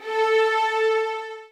A, soft violins; Q Up Arts; Denny Jaeger Master Violin Library

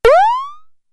DF-500 Misc, Drumfire

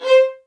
MED ATT C5, soft violins; Q Up Arts; Denny Jaeger Master Violin Library

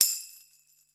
tambourine; Zero-G; Total Drum Bass; Drums Percussion

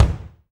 BD, DR BD; Best Service Gigapack 2

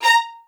XST1 A#5, LOUD VIOLINS; Q Up Arts; Denny Jaeger Master Violin Library

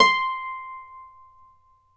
Celtic Harp, Plucked Strings

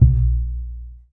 TUB BASS A#1

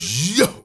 HEY HEY, MALE NOISE, Heart of Africa

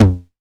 909 TOMS

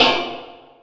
Wah Oneshot; Sonic Foundry (Sony Creative Software); Esoterik Beatz

